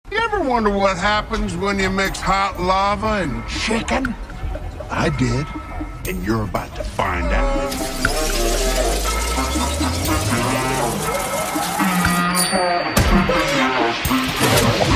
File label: Funk slowed